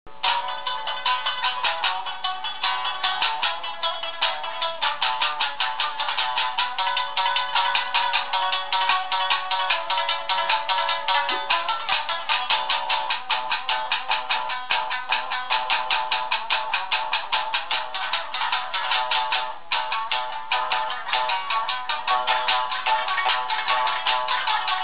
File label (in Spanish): JAPÓN Tradicional